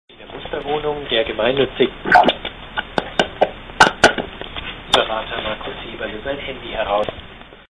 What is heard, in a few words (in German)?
TV Kabelstoerungen